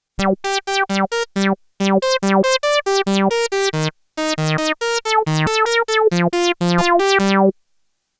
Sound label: Marion Systems MSR2 Funky